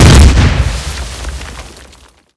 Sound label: c4 timed c4 explode lite